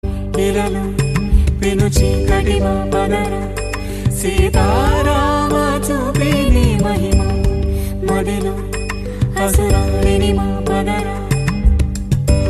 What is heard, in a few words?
sad ringtone; sri rama